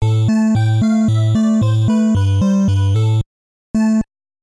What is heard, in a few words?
In the Castle - Fail; Mappy Land; NES